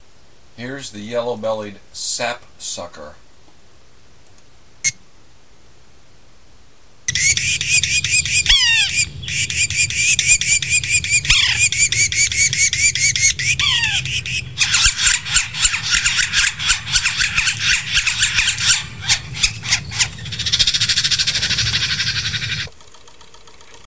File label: yellow bellied sapsucker, birds; birds-and-frogs